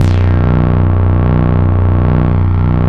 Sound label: FILT BASS; Big Beat Samples & More